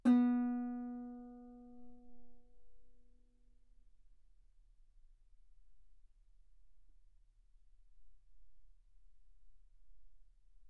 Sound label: Guitar ff B3